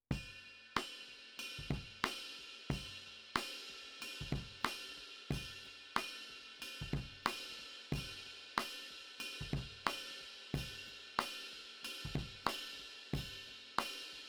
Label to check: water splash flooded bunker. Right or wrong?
Wrong